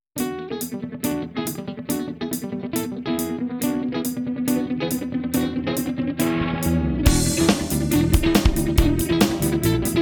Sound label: Maxon VJR9 Bonus effect